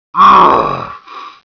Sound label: pain, babygarg